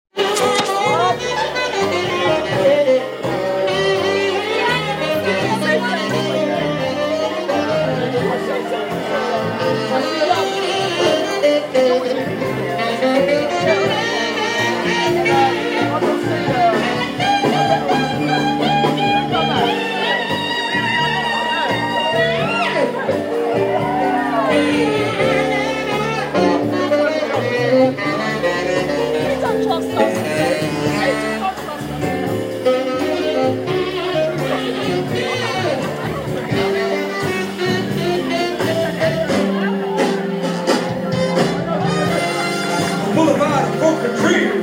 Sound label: jazz